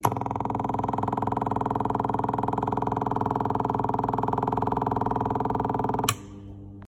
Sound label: cork